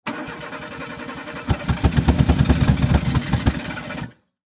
Car Fail To Start, Transportation, Automobile, Car, Fail, To, Start, Dead